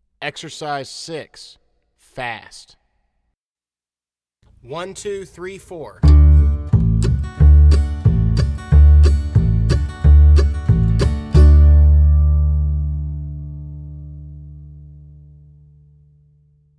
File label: Exercise Fast